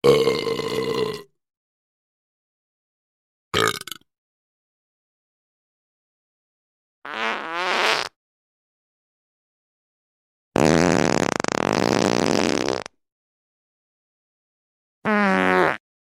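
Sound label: sounds of burping and farting short and long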